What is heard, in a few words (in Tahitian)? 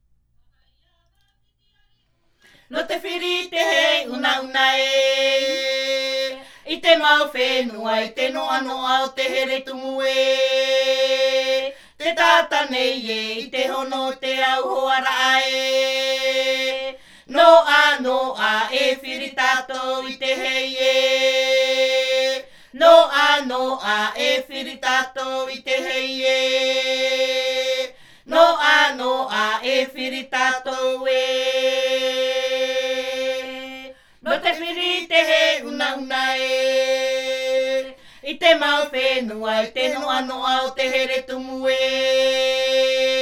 Himene tarava Tahiti, chants polyphoniques maohi